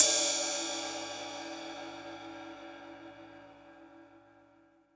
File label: Ride, Cymbals